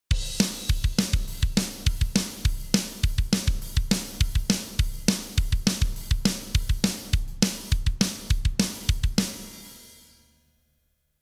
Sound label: drum On